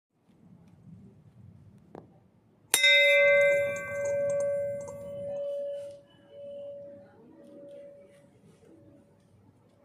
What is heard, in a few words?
bell